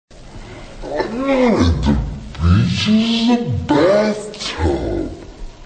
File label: bath